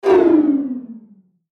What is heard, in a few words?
Descend Wah Sweeper; Descending Sound Effects; Descends; Blastwave